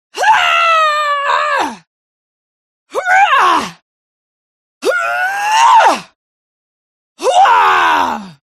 HUMAN VOCAL Female Combat Attack Long; smartsound

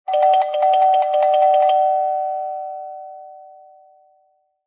household doorbell ring fast several impatient

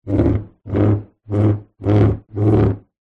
household chair wooden drag hard floor

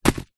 brick drop on hard ground; zapsplat

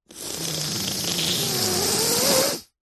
human sniff snot cold nasal close up long; zapsplat

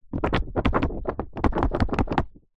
household book thick paperback shake; zapsplat

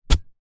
metal shovel spade single dig grass soil; industrial; zapsplat

